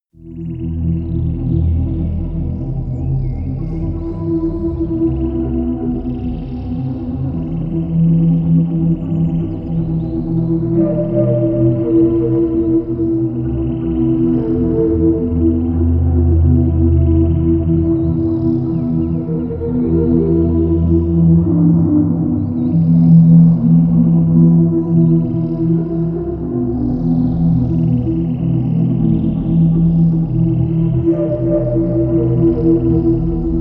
jungle flutes

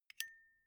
zippo flicked open ringing

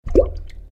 water drop

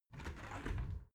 window open soft

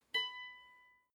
guitar clink single

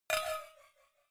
cowbell clank muted soft

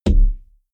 window punch deep

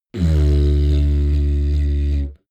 didgeridoo jump medium

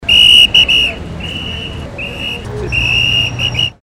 police whistle

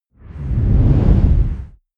whoosh dark storm short

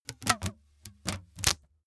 package tape dispense hard

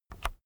keyboard external key release soft

